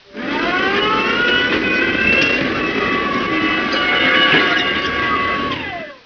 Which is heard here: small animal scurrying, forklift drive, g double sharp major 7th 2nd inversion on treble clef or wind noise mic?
forklift drive